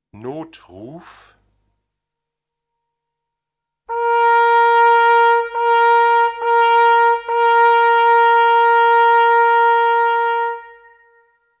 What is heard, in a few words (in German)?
Notruf, Notsignale, Jagd; jagdliches Brauchtum